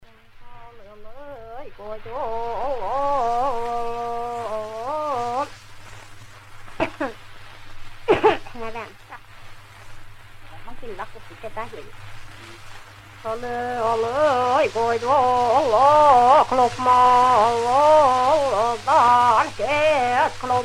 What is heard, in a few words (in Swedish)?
jojkar; en vuoleh om Kluma fjallet